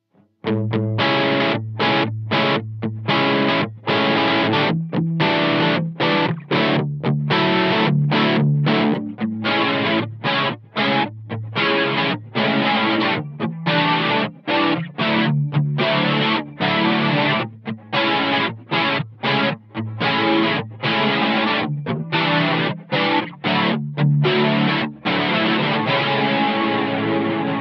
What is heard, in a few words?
distorto chorus